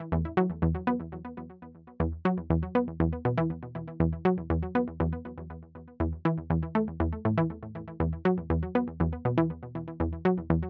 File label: Mellow Mix Stem Loop Layer 2